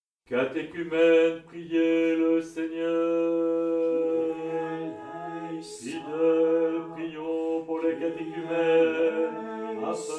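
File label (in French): Litanie des Catéchumènes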